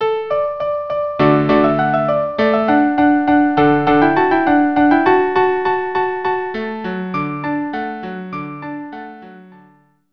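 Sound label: violin cello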